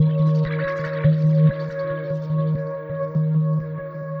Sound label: Iceplanet, Pads; Sonic Foundry (Sony Creative Software); Synchro Funk